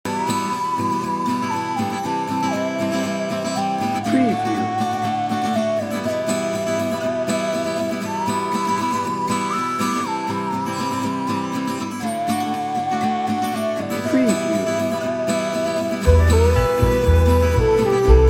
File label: spaghetti western bed